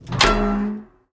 door open; Golden Crayon Sounds

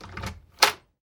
door open; Golden Crayon Sounds

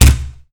wall wood; Golden Crayon Sounds

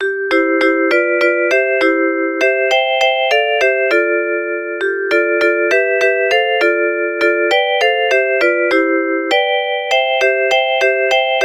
main music; RS Games Sounds; Dreidel

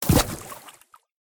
water step; Golden Crayon Sounds

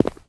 concrete step; Golden Crayon Sounds

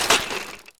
bike off; Golden Crayon Sounds

